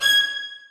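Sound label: 2nd violins staccato g6; Sonatina Symphonic Orchestra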